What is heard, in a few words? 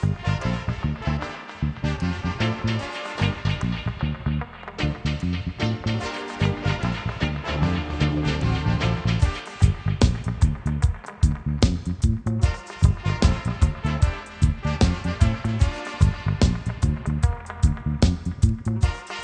reggae